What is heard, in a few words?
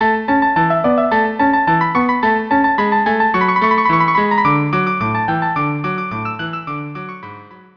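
violin viola; Prelude to act 1; carmen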